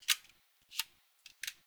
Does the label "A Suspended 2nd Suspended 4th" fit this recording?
No